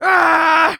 Human Male Scream Voice

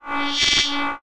Science Fiction Sci-Fi Electronic Torture Device

